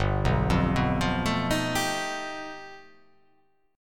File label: G Augmented 9th